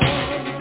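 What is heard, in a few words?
snare drum + noise; star-sdrum+noise; Amiga 8-bit Sampled Voice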